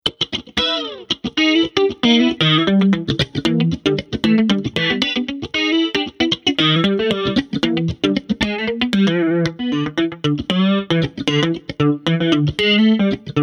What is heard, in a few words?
Funky Jazz Chorus Solo, Jazz Guitar